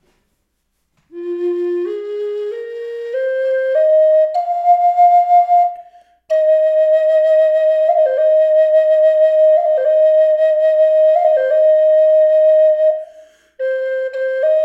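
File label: reverb, flute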